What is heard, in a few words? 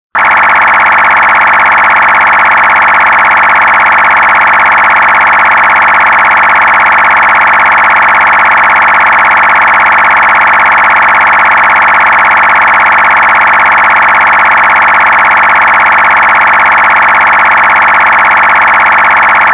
fsk441